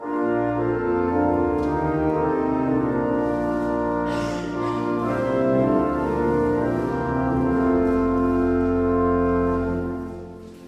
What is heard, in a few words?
hymn